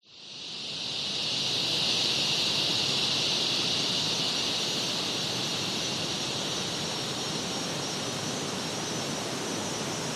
nature forest amb strong wind trees cicada waves opening